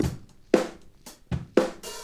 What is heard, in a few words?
Boombap drum loop